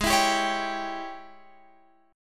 Ab Major 7th Suspended 4th Sharp 5th Ab Guitar Open F; strummed; x,15,16,14,16,14; down